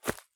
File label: sprint grass low; Escape from Tarkov